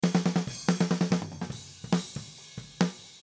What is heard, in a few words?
Distance Snare Far